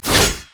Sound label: basic attack; LoL; aatrox base